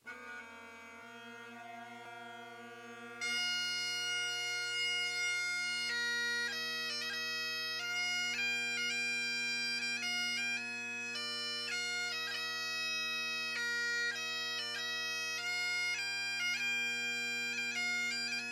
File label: Oh Christmas Tree, bagpipes